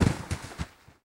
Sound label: Snake Fall, Thud